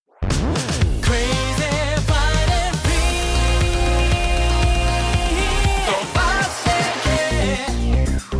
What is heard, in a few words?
Dirty Disco